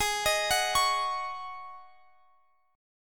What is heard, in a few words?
Db Suspended 2nd Suspended 4th Ab, chord